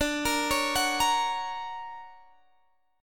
D Augmented Major 7th Guitar Drop D, chord

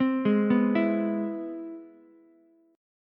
C Augmented Major 7th C down Ukulele; arpeggiated; JGuitar

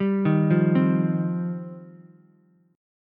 G 7th Suspended 4th Sharp 5th, Ukulele; G7sus4#5 arpeggiated

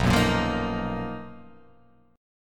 B Major Sharp 11th

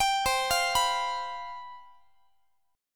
C Major 7th Suspended 4th, down, Guitar; CM7sus4 arpeggiated

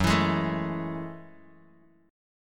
Gb Minor 9th, Bass Standard; strummed; JGuitar

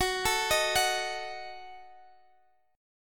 Eb Diminished Gb, guitar

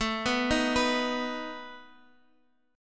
A Suspended 2nd Flat 5th A down

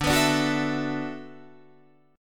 D 6th Add 9th D, Guitar, Open D

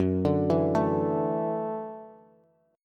F sharp Minor 7th Sharp 5th, Bass; arpeggiated; JGuitar

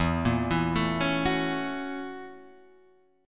F sharp 7th Sharp 9th, E

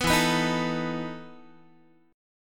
E Major 7th Suspended 4th, Guitar; EM7sus4 strummed

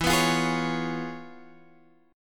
C sharp 7th E; C#7/E; strummed; JGuitar

